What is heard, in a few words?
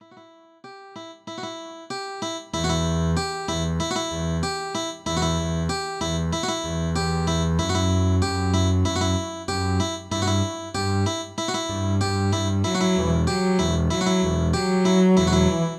more strings